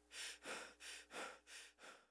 sprint grunts; player; dod 1.3; dod enhancement pack

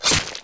knife hit; dod 1.3; dod enhancement pack

